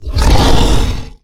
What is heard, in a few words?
Exertion Light; Evolve; Goliath